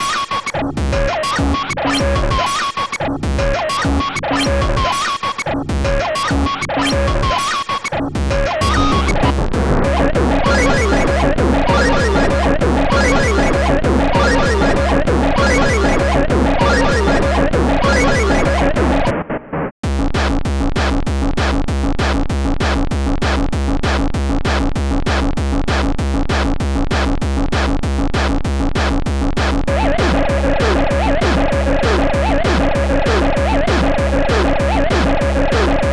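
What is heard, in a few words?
keygen song.xm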